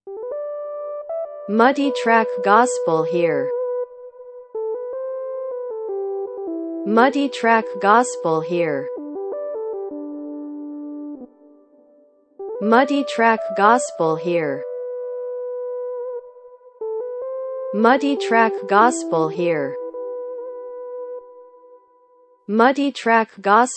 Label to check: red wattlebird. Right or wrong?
Wrong